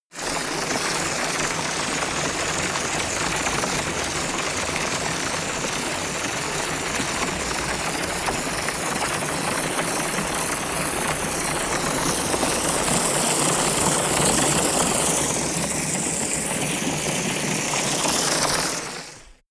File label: filling paddling pool